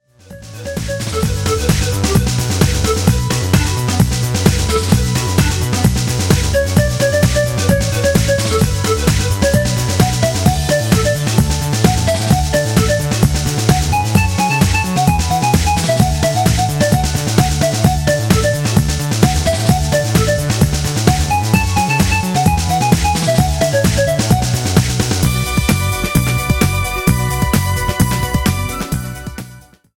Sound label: pan flute